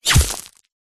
warfare bullet whizz hit ground dirt small stones debris; zapsplat